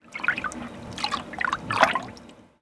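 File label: cauldron stir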